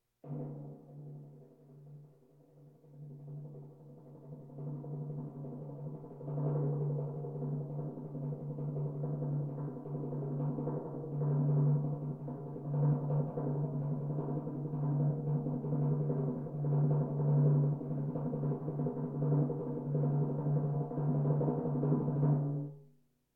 Percussion Bongos Tympani rolls Gongs Drums

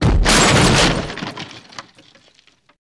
door attack; llorona; DeadFright

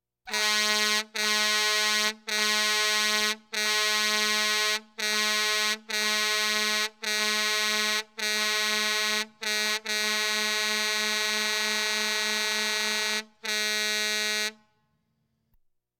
Police, Fire engines

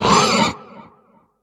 Great Wisboar Mage Death; Kilta

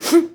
Boxing Female Punch Breath

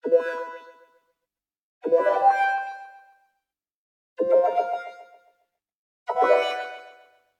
Arcade Click Neutral; boom library; The Interface

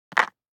DICE on Cardboard, Drop, Standard, Six Dice; Samples; ArticulatedSounds; DICE Sfx Party (Extended)

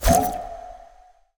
Ice Spell Utility Detect Magic; Arctic Aura

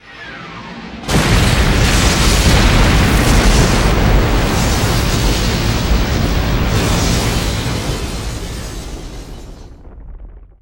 Explo Large Bomb; Destruction

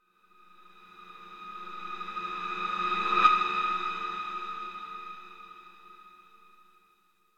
Feedback Intrusive Tone - Strongest Middle; Hollywood Edge; sound designer's toolkit v2

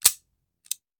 Hand Gun Foley; Sound Ideas; Guns

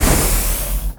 Pickup Scifi Health; SFX Kit

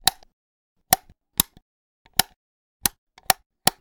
switches; NS Studios - ultra sound collection

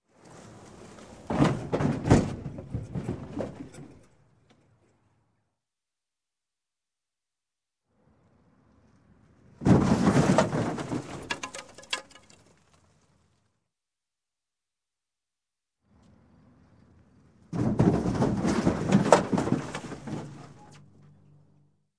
Crash, Auto Large Side Swipe; Sound Ideas 2000 Series

